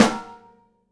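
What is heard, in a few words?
snare bottom DAMP, Live Snares; E-MU Producer Series Vol. 8 – Platinum Phatt (CD 1)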